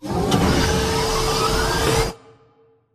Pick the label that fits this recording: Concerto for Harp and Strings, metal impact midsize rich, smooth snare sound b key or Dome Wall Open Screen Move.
Dome Wall Open Screen Move